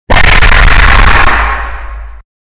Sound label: scream loud; rake